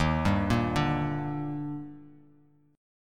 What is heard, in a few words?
D sharp Major 7th, Bass Standard; arpeggiated; JGuitar